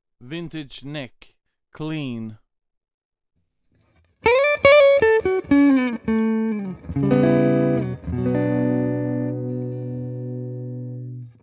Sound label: HB Vintage Neck